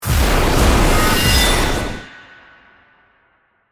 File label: Hit Complex Feedback; Hit Sound Effects; Sound Design